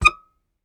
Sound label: Very Short Note, Melodica